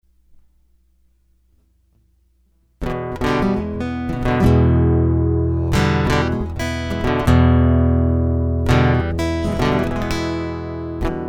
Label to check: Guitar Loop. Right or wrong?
Right